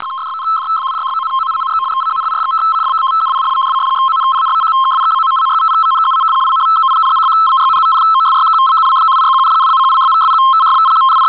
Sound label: unknown signal